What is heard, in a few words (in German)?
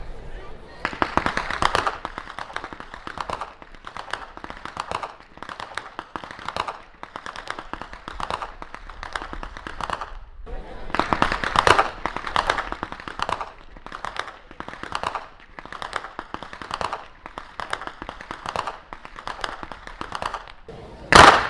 schnalzen; SURHEIM II; saaldorf; saaldorf surheim freilassing